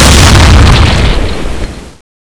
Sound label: explosion tnt; dod revolted steam